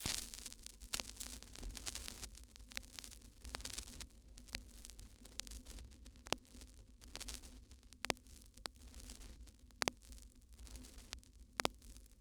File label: Vinyl Crackles